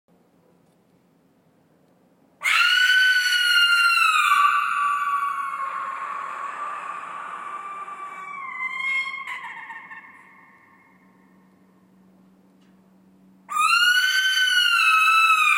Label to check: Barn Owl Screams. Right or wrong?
Right